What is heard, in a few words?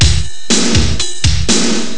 rock